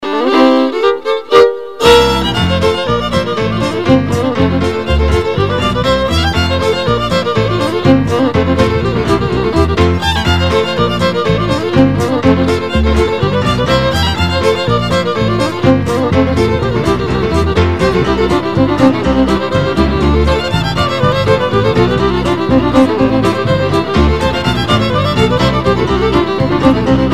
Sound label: hornpipe